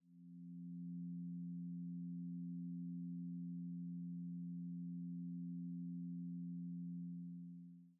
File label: wind, ambience; two-rivers